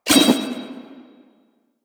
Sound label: Spear Gun Equip; Weapons; Warframe; Tenno; Hoplite